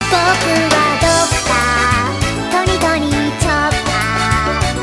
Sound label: singing; tony tony chopper